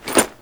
Jump Land

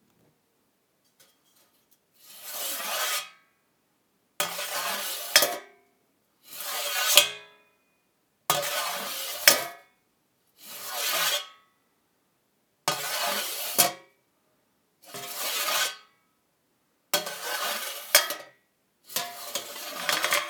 Bakery Plate In Oven

